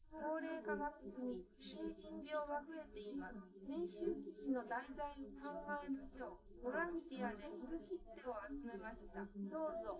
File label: female live rec 250ms 1m